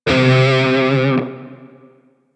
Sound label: Grineer Shawzin Flourish Oct Two C; Music; DE; Warframe